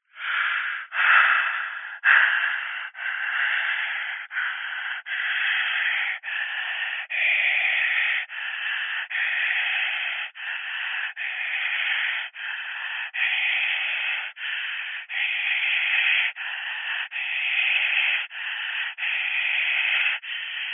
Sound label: human heavy breathing down telephone receiver weird agressive creepy; zapsplat